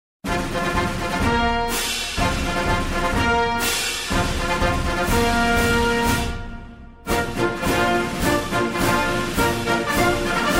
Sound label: Ruffles and Flourishes Generals March; 14th armored cavalry regiment fulda germany